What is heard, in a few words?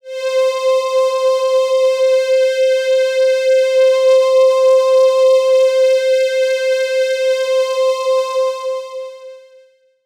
Prologue PAD Phase - C; One Shots; Cymatics; Fantasy Synth Sample Pack